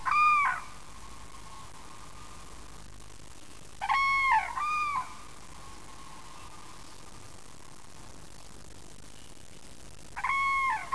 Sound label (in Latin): Grus grus